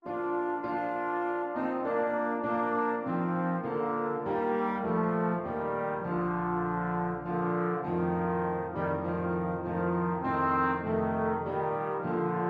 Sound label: away in a manger, trombone duet